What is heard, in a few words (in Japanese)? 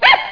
nakigoe